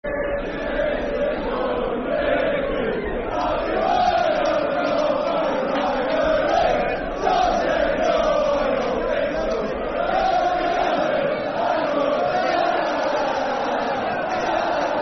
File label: Football Chant (Soccer Song) Leeds; Leeds Lufc; Gelderd Aggro; fanchants